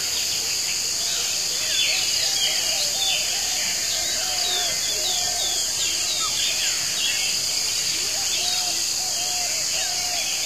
jungle mix; Source Engine; tf; lair

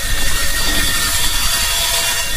canister scrape smooth loop, physics metal

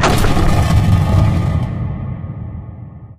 slow crusher open start; Source Engine; Portal 2

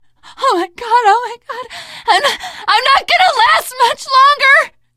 dying, teengirl survivor voice; source engine; left 4 dead 2

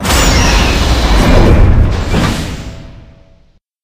awake chamber door close

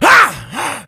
been shot, infected

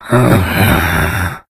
become alert, infected; Left 4 Dead 2; Source Engine

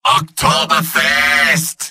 cheers; medic; video games; source engine; tf; mvm

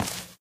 white tile, footsteps

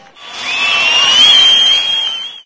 baseball exclamation; sound schemes

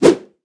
lunge, hunter attack; player; source engine; left 4 dead 2

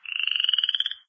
small frog, random animals, frog groups; Left 4 Dead 2; Source Engine

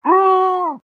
pain severe; pyro; source engine; tf

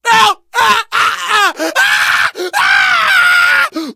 death scream, survivor voice

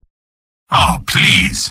negative vocalization; spy; video games; source engine; tf; mvm

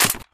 item heavy gun pickup

